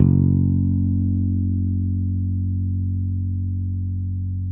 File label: BASS F#1, electric bass